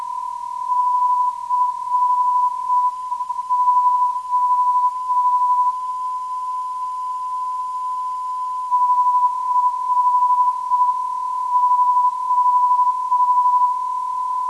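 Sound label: non-directional beacon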